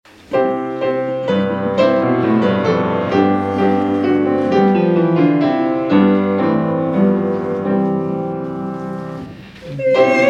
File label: Hymn; Become to Us the Living Bread